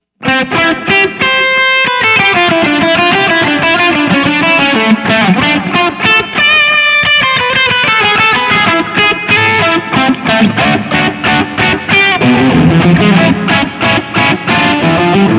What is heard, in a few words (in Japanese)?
お城BGM; ドラクエ3